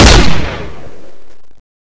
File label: firecracker shoot